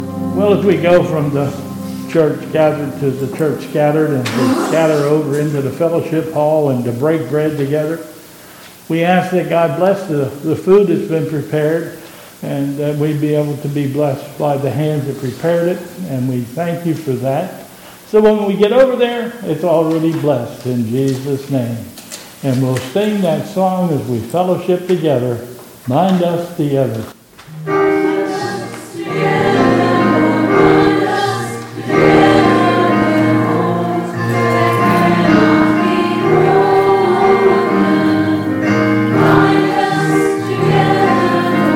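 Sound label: Prayer for the dinner and Closing Chorus, church service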